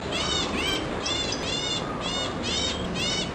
Pied Thriller Juvenile calls; Amar

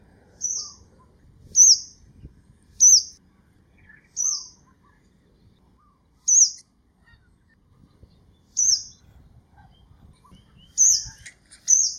Pacific Swallow Batu Gajah Perak Malaysia

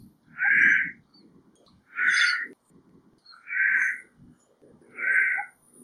Asian Koel juvenile calls; Ipoh Perak Malaysia

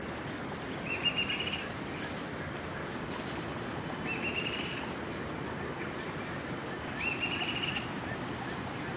Pied Thriller calls; Amar

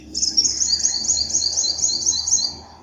Olive backed Sunbird calls; Ipoh Perak Malaysia

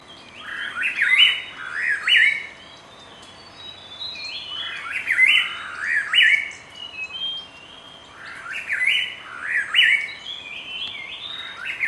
Chestnut crowned Laughingthrush calls; Amar

